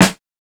Fairlight CMI III Snare, Drum Machines

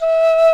HRP RCDR&FLT

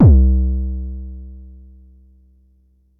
Bassdrum, Jomox Xbase09

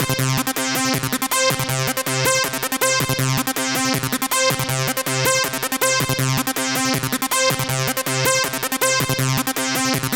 gro 160, Hardcore Loops, Arpeggios

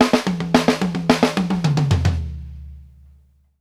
STANDARD, FILLS 108; Sampleheads - New York City Drumworks VOL-1

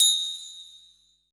hi bell; BPC PERC

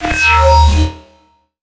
D bass

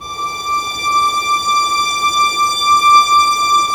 STR SEC, Roland LA Composer, Sec Mute St

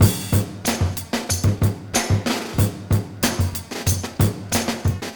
breaks

